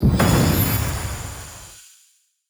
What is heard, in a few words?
crystal explode; boss